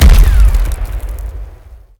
Sci Fi Grenade

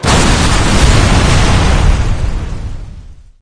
Vehicles; SFX Vehicles Manta

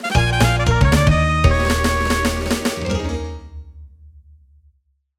death; donkey kong country 2 fan remastered; Snakey Chantey

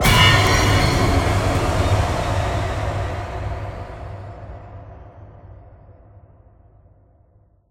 Music Forest Hunt Jumpscare

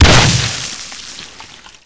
Weapons Bio Rifle

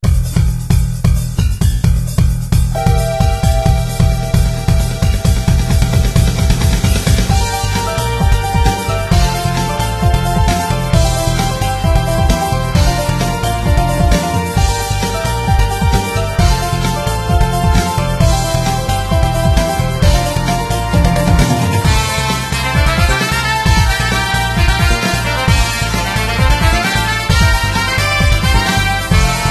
Dungeon; Adventure Bar Story; Android game music

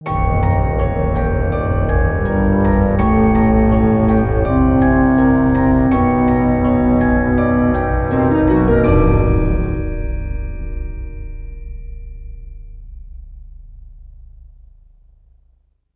Sitar

